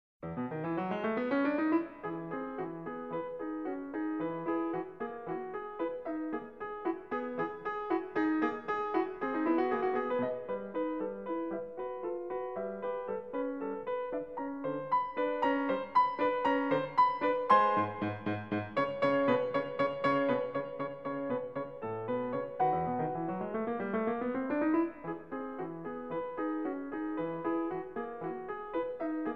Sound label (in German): Rondo Fuer Klavier E-Dur; Laitenberger